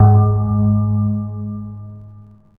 Percu; alone in the dark 4

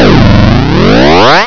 zoom

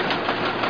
amb tech; abuse

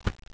key hit

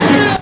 mec step

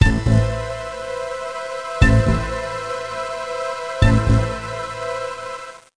sting; Into That Dark Night; YadVashem; music

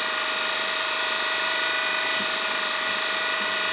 parts sound 4wheel; Macworld (1999-02); Games World; DroidWorks demo

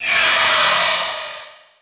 force blind; MOTS

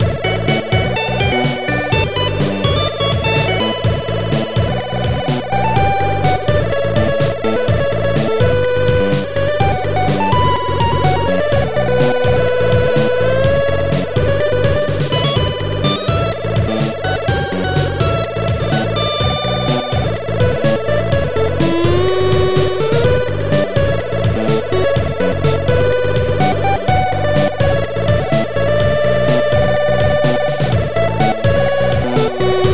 FUSION

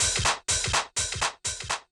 DRUM SHOT HOUSE 125; eJay; Singleshots

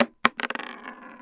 wood; Shadow Man; weapons; deagle

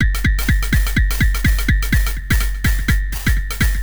HOUSE 125; eJay

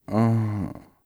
groan; Singles Flirt Up Your Life

